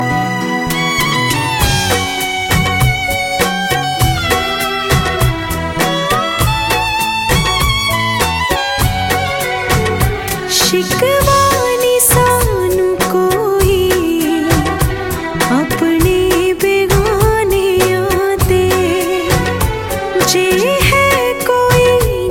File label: sad tune; rnb